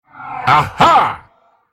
spell cast effort, en US; dandychogath; Character Voices - English

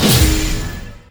double attack; LoL; monkeyking

